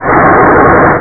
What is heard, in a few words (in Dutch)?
RUIS